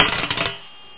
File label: Bbq gril